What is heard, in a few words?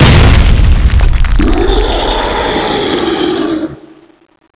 roar, npc; oberon